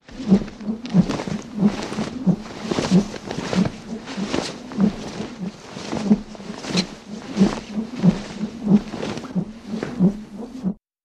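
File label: Pterodactyl Flying, dinosaurs; animals wild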